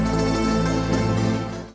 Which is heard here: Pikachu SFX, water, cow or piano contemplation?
cow